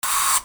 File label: HOUSE